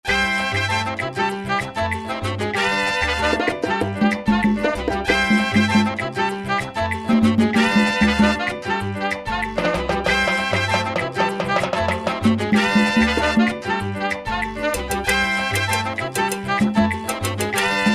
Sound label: Salsa Mix, Roland SR-JV80-18 Latin; World; Roland SR-JV80-18 World Collection Latin